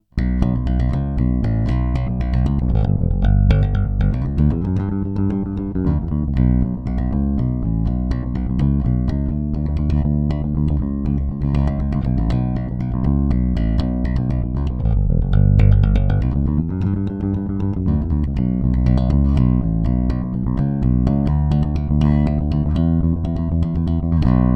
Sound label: Both Finger